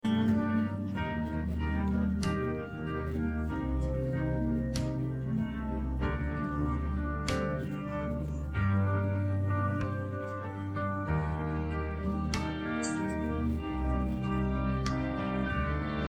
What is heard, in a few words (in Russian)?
no vocals, музыка